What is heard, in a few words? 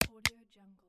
gamepad controller main button